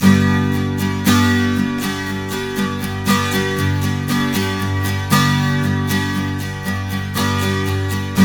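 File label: 12 string major G#, guitars; Spectrasonics; Hans Zimmer Guitars VOL-1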